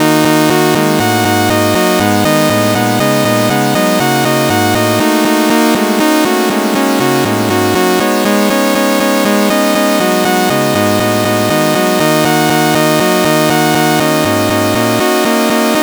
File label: sawtooth minor blues; Minor Blues Scale; music generation library in Rust